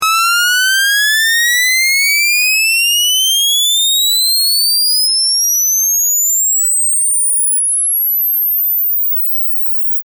saw sweep lin 20 20k base1280 constant 4x oversample